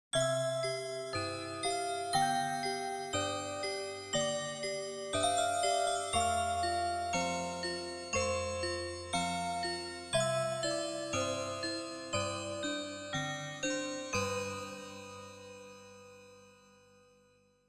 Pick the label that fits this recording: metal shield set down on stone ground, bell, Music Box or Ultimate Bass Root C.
Music Box